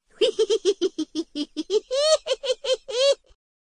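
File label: giggling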